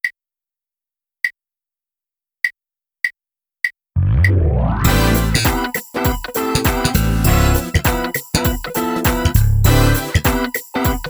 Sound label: drumless